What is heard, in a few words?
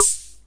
rim cym, Ace Tone Rhythm Ace FR-1